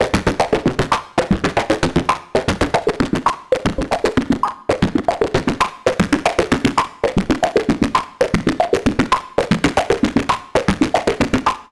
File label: Simple Seq noise